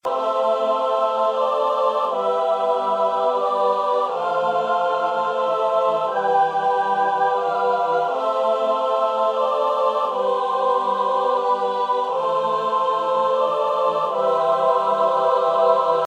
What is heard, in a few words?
choir loop; source of tales